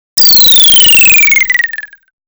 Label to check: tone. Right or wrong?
Right